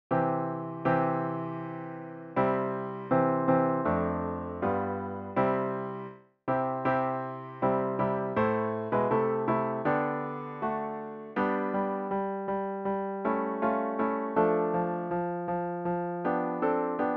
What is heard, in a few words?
piano track